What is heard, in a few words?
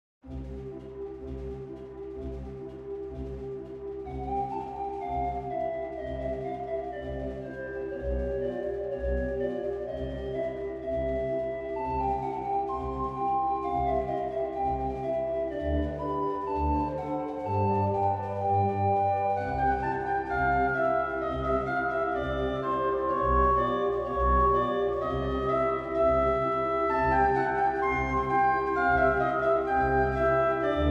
Piano Grade 1 Finger Exercises Ideas